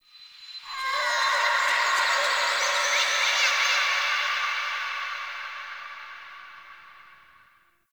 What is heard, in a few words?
Zariman Park Memory Echoes; Warframe; The New War; Ambience